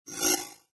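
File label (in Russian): bystroe poyavlenie tekst, quick text appearance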